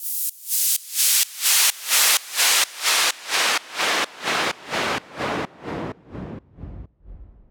Fall Side Chained

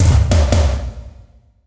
EDM Drum Fill; FL PRO

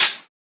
Open Hat; FLPRO

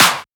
Clap; FLPRO

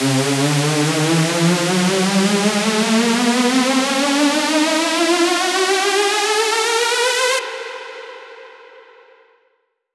Saw C

